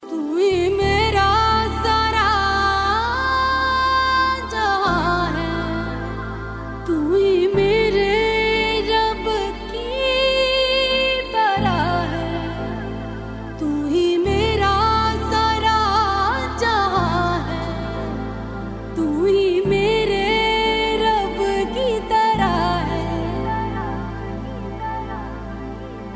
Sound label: female; Indian